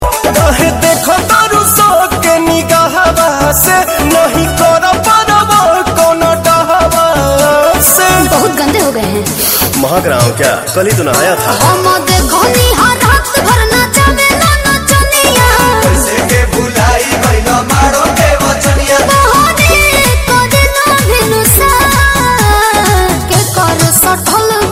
Bhojpuri Song

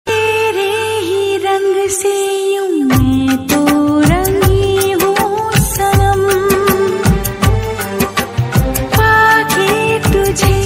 holi song

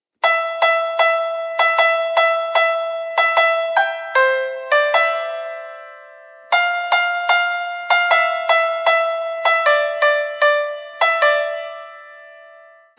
Christmas Jingle Bell

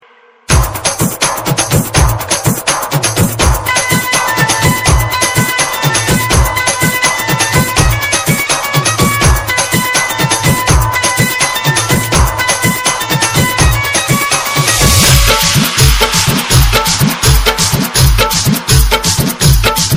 dj Remix song

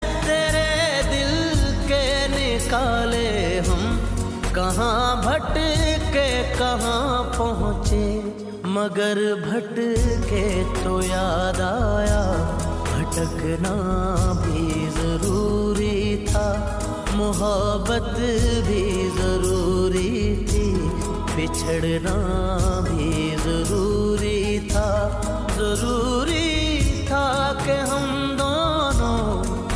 Sad Song

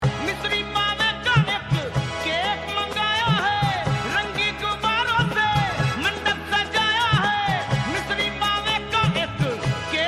Happy birthday to you

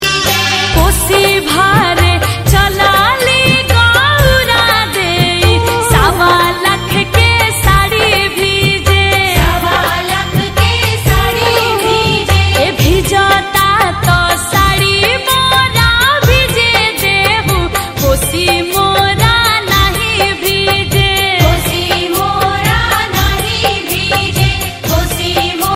Chhth Puja

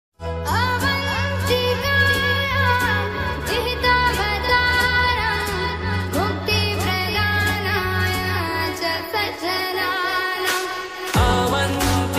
Female Version

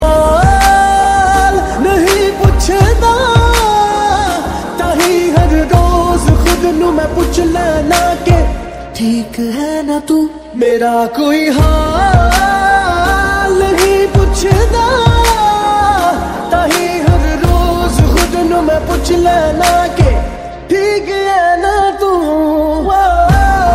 Punjabi song

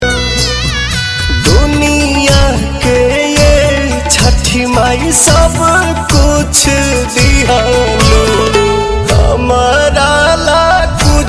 chhath Puja special

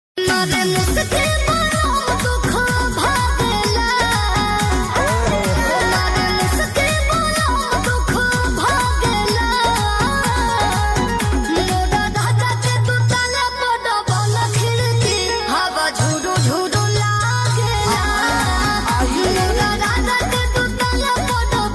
DJ Remix

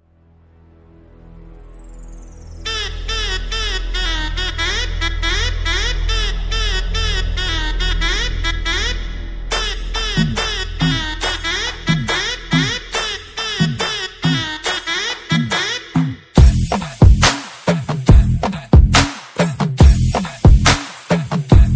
Telugu; Gangs Of Godavari

